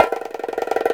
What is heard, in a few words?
BONGO ROLL; Hip Hop; E-MU Formula 4000 Series Vol. 1; Hip Hop Nation